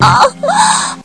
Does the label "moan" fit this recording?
Yes